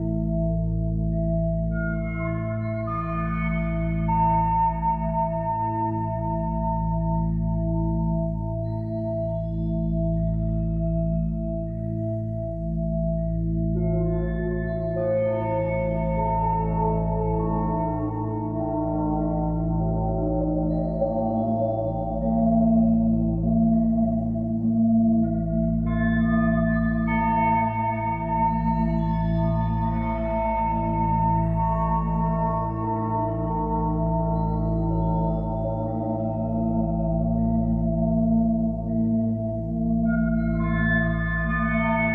Drone, Resonant, Bed, Solemn, Mystical with Ring, Accents, Prominent, Squeaky or Gong, Loop; Light and Dark Drones